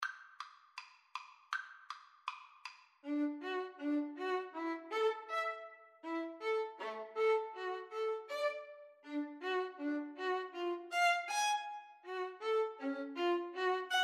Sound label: pizziccati violin